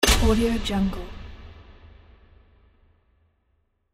big light switch; archisound

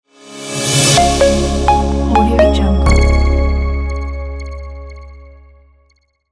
makeup logo

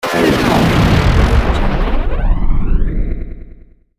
heavy incoming hit with noise delay out

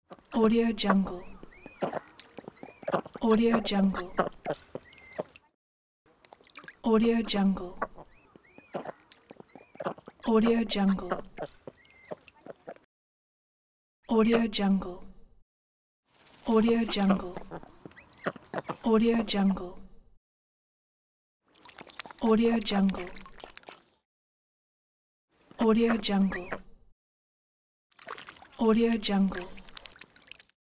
duck; teldrassil

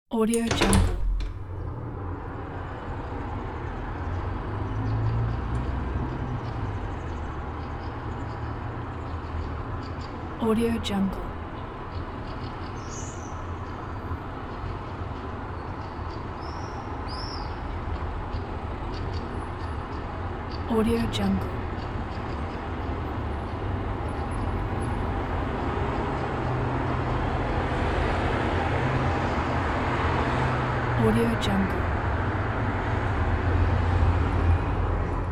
apartment with open windows; sviridpavlov

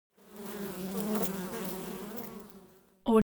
animal insect bee buzz; smartsoundfx

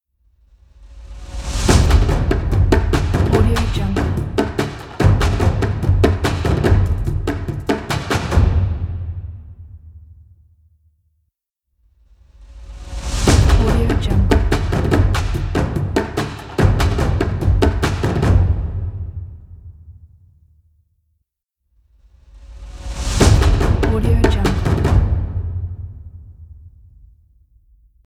percussion logo

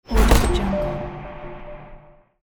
activation switch

